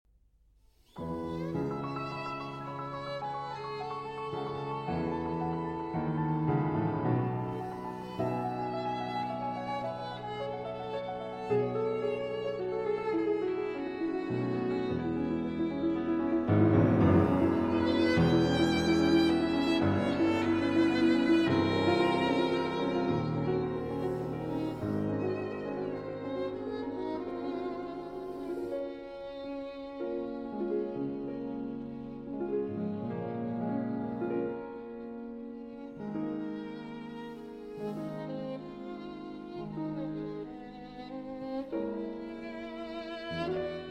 Violin Sonata in A Flat Minor, Ballada

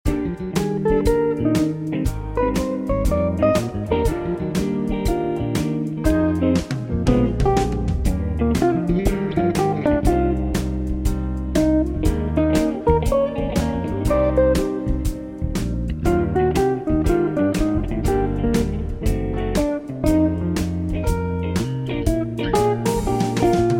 Blues

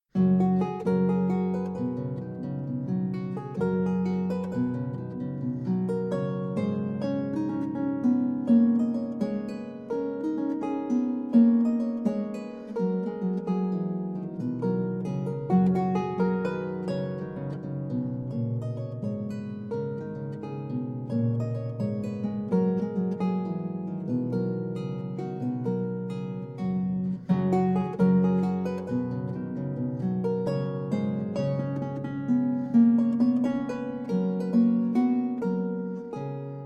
Spanish Baroque Harp; Una Niña Pregonó

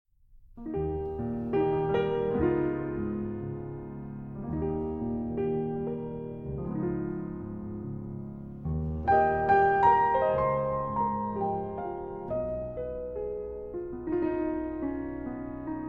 Traditional Jasmine Flower, Piano

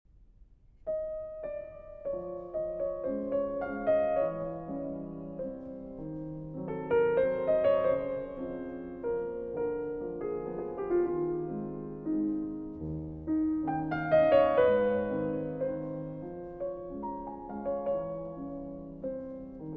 Waltzes in A-Flat Major, Lento

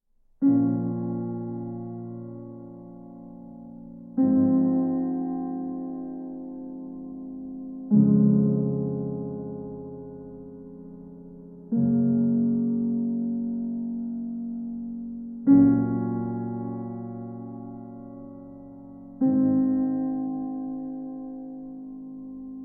Piano Version

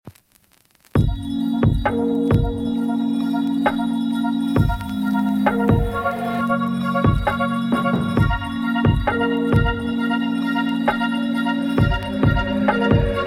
Instrumental

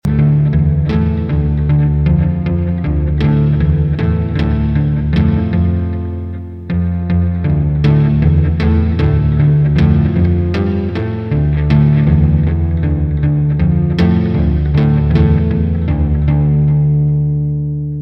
Electrical Cello Blues Drone; Berlin

